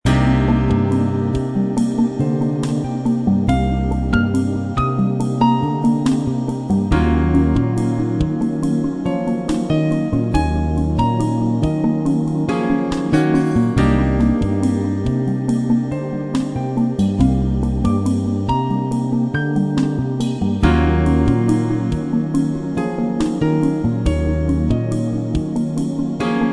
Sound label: chillout